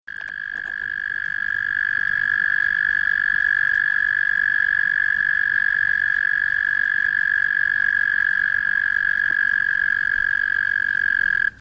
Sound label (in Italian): rospo; test riconoscimento versi animali